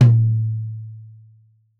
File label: Tom2 Stereo, Deeply Sampled Drums